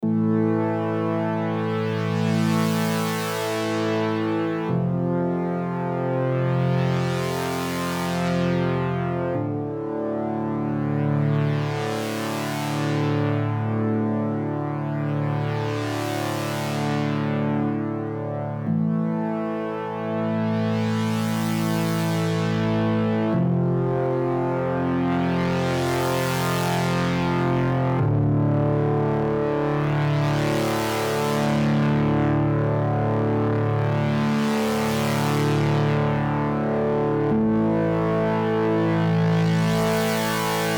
Din Feedback Doofer example; Doofers

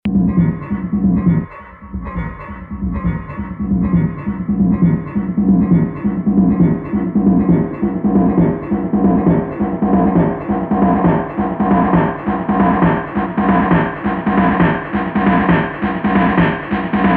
no room example

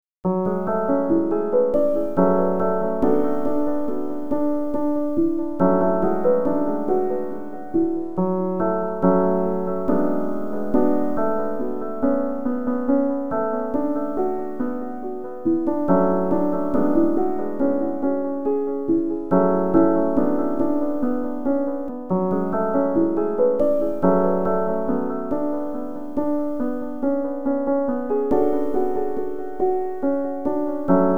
Piano

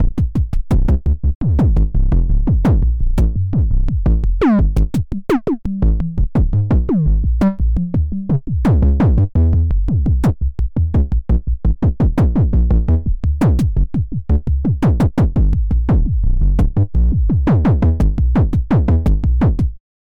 XB-09 Kicks Dry; Danoise

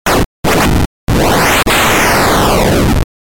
Synth BitNoise Pitch; Metalthrix; QiTaNo

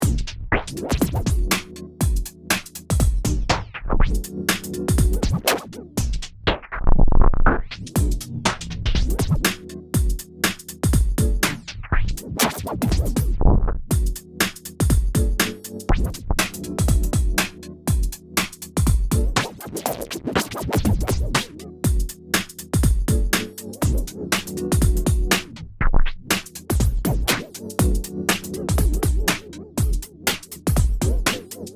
Vinyl Scratching

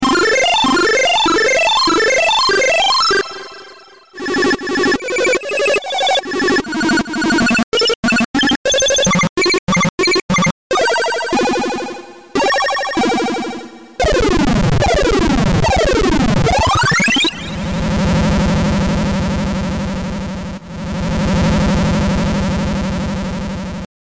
Synth ArpMaker; Metalthrix; QiTaNo

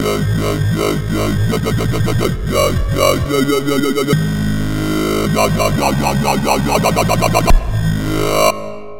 dubstep

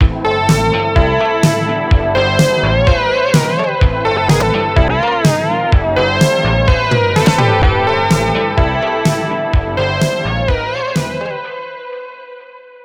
vhs tape

